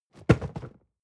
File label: cardboard box medium fall; objekte